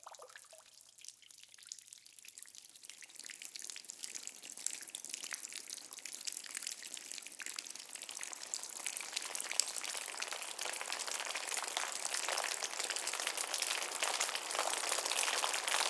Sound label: ink dripping startup; batim